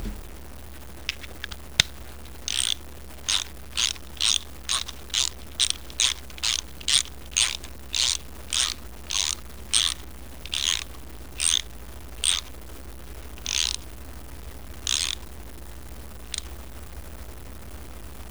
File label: RATCHET